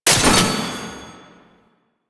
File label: Rocket Launcher Mech; Weapons; DE; Warframe; Tenno; Lasrian